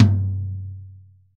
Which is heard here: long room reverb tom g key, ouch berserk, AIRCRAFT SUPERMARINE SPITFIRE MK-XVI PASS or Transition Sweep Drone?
long room reverb tom g key